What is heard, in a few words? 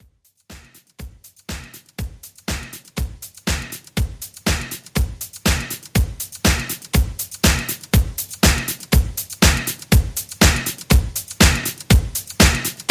80s Reggae Re Drum 121 BPM